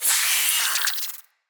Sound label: root spider vocal pain; Animals; Spiders; Vocals